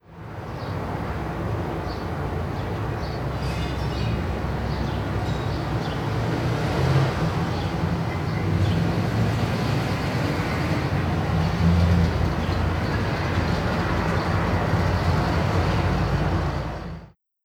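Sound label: Dierkow Town ambience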